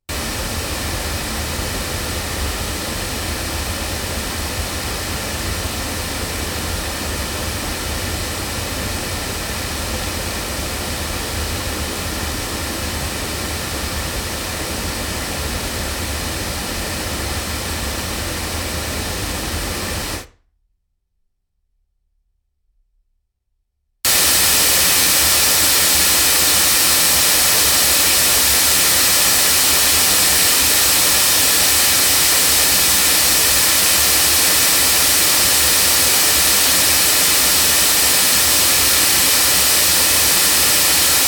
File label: Oktava mc219 loudspeakers